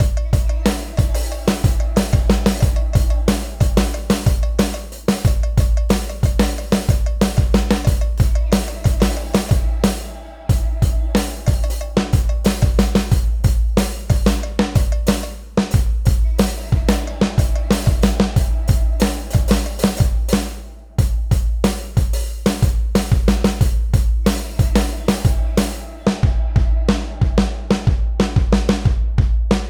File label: DRUM